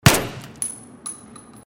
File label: PP-19 single indoors